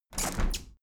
window handle plastic squeak close heavy; objekte; fenster

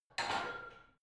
barbell heavy plates lift rack reverby; sport freizeit; fitnessstudio

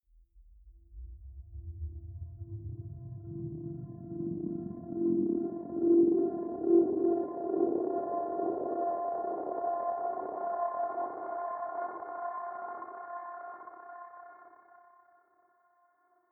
static string low; risers

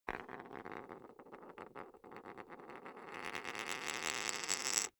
poker chip spin long; soundpool

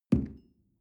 coffee place tablecloth soft

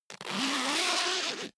zipper pullover jacket open

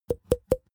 snap cheek sequence; soundpool

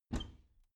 bathroom cabinet doors open regular; objekte; tueren

